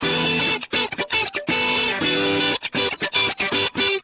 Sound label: change, guitars